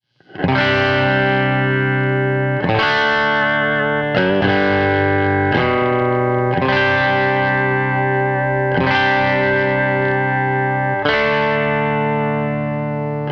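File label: Country Guitar, Chorus 1; Digidesign; Pro Tools Essential Loops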